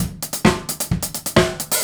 Straight Kit, World Pop Beats

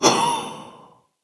Mouth Fx, Vocal

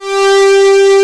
PA, ANA PAD

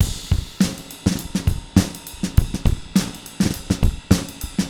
Spungy Kit, Drums & Percussion Acoustic; Electronic Point Blank

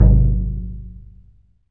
Surdo; E-MU Producer Series Vol. 2 – More Studio Essentials; Composer; Surdo Pallet

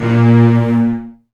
A#1, Virtuoso Strings; ILIO; Kirk Hunter Virtuoso Strings VOL-2; 10CL TRM FX

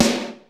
SNR; Hollywood Edge; The Big Bad Beatmachine; XR-10

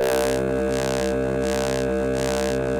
700 MOD1 C 1, Korg; Korg 700S; Back In Time Records; Korg Universe VOL-1

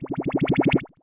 Bubbly, Synth FX; musicradar; cartoon caper

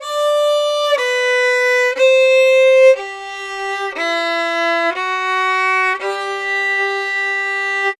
Fiddle, Appalachian; Continental Drift Aftershocks

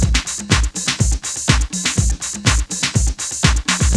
CLAP LOOP, BREAKBEATS; Crypton - DrumBase5000; BREAKBEATS#2

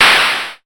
Clap, Lel UDS

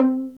P VIOLIN C4; SOLO PIZZ ST; Greytsounds VOL-1

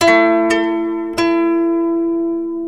KOTO LOOP; USB Soundscan vol.60; Asian Traditions; AKAI

